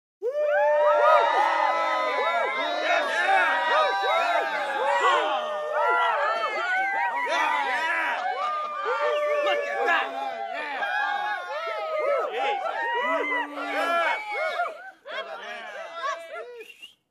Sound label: Crowd cheering and celebrating